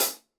hi hat 14 inch; soundscrate

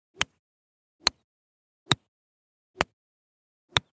golf club rescue stereo; soundscrate

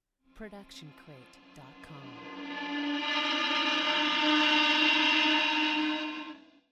Distorted Haunting Voices; soundscrate

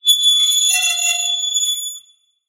train screeching halt; soundscrate

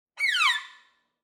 Violin Slide Down

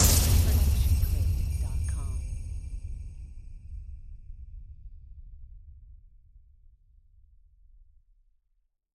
Magic Explosion; SoundsCrate

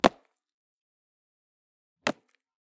jumping on cement; soundscrate

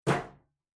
Metal Footfall; soundscrate

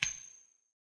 coin flip; soundscrate

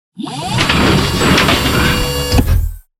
Robotic Movement; SoundsCrate

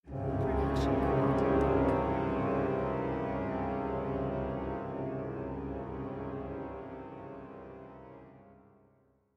low dissonant chord

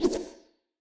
anime whoosh twice

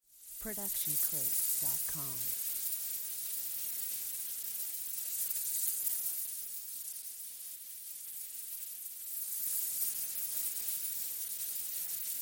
Magic Portal; SoundsCrate

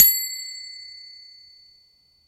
Motion Design Bell; SoundsCrate

